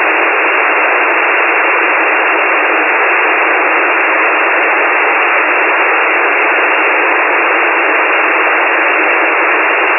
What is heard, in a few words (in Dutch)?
SSB ruis